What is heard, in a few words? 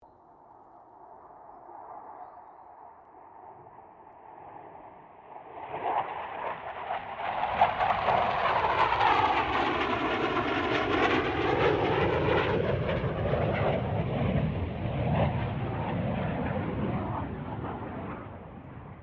AIRCRAFT CA-27 SABRE PASS STEREO; CA-27/F-86 Sabre; Airplanes